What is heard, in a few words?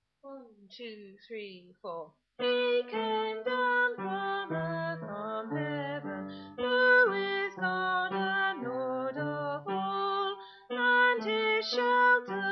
Bass